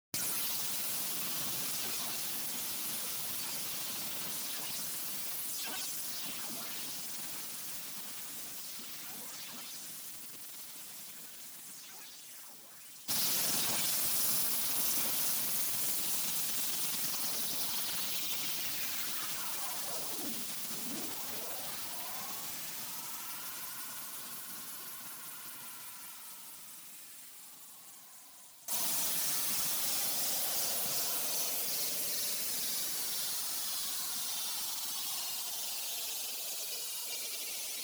Stem 3, version « Mix », après égalisation dynamique

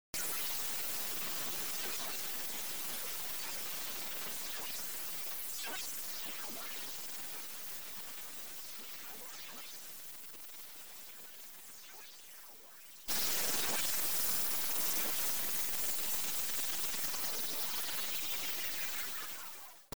Stem 3, version « Source », après égalisation

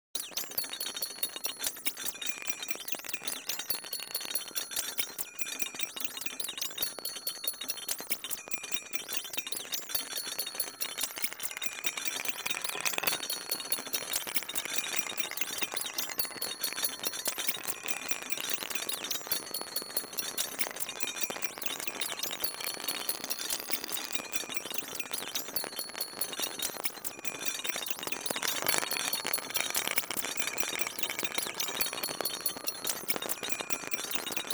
Sommation des 3 stems pré égalisés

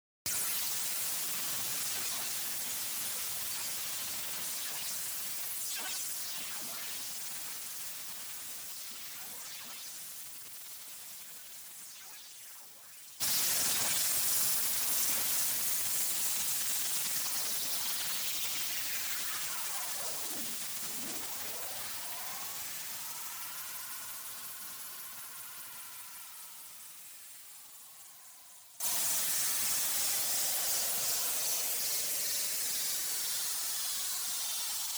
Stem 3, version « Mix », avant égalisation

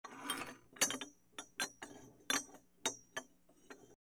Stem 1, version « Source », après traitements

